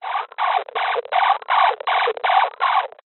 science fiction transmit error burst; zapsplat

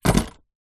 transport car keys down on dashboard

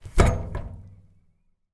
household metal wheelbarrow set down on grass

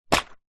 box card small safety pins pick up; household

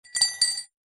household cutlery item set down into glass; zapsplat

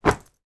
shorts drop on floor; foley

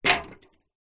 household plastic plates drop

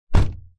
vehicles car door close internal; zapsplat

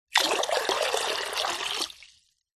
water pour into glass jug; food drink

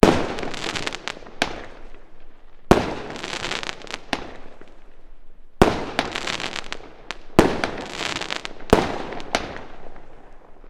fireworks multiple explosions fizz; sound effects one

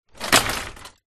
vehicles bicycle small childs drop down on others

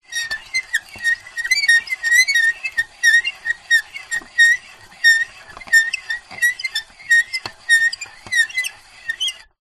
plastic handle turn squeak; foley

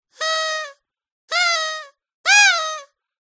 leisure party whistle squawker; zapsplat

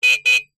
motorcycle horn honk

